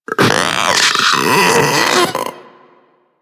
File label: Infested Splitter Split Top Vocal Alerted; Warframe; Grey Strain